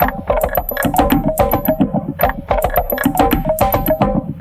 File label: Bamboo Mbira Beat, Composite Beats; Sonic Foundry (Sony Creative Software); Myths of Technology